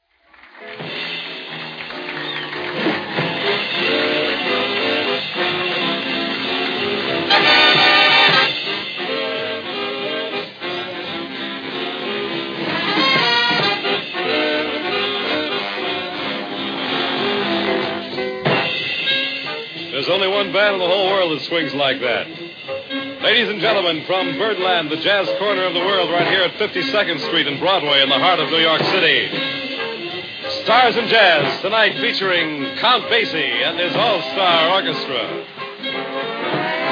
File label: Stars in Jazz, Orchestra Live from Birdland; NYC